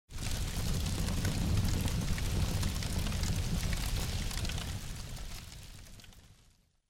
Deck Fire; Age of Empires III; cinematics

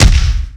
Mega Face Punch, hand to hand combat; Sony Pictures Sound Effects Series

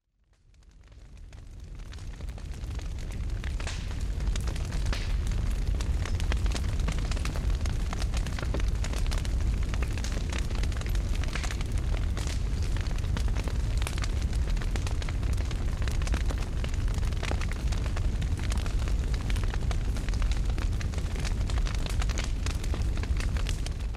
Fire; Age of Empires III; cinematics

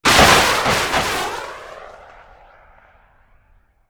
rocket launcher fire; Weapons & Explosions; Sony Pictures Sound Effects Series

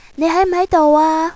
chat beep; ICQ; Cantonese girl

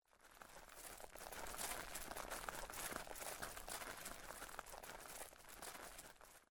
Footsteps; Microsoft Studios; Age of Empires III - Complete Collection; cinematics

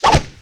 Kung Fu Old School Whoosh, Hand To Hand Combat; Sony Pictures Sound Effects Series

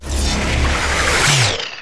shockwave; battlecastle

